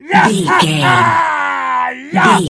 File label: voice male battle laugh